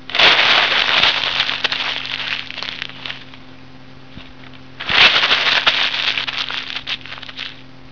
PAPIER

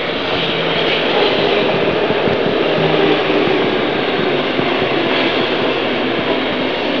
UBAHN

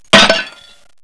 Buchse

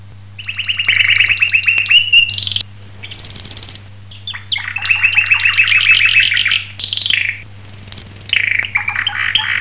Vogel

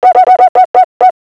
HOLZ

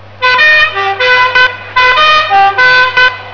HUPE

